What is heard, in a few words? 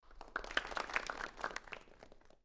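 Crowd 50 Short Medium Burst, small interior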